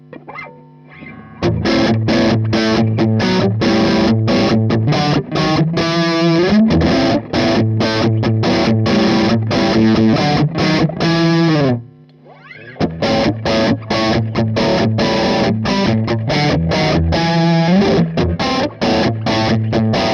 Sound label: Riff